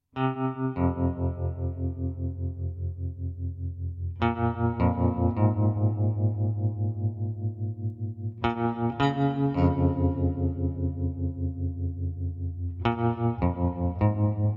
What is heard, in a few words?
GTR Only